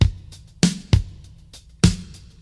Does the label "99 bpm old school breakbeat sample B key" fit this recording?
Yes